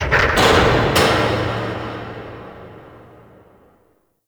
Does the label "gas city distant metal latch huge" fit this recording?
Yes